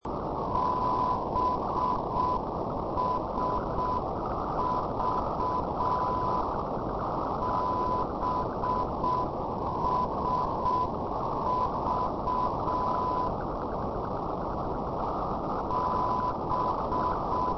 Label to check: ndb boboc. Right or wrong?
Right